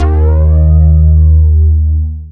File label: BASS, D#2; Zero-G; Chemical Beats